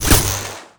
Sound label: arc